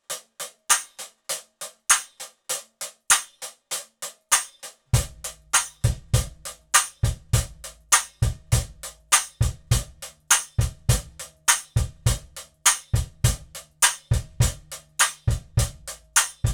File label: Kit Drum Play Along